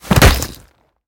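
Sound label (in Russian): сокрушительный яростный удар с кровью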